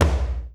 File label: tom one shot big slap